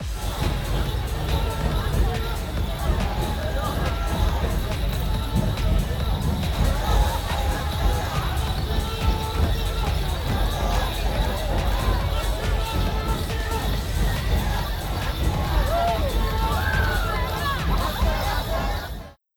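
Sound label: Black Rock Campsite left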